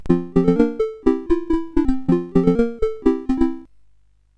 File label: sid, CSG8580R5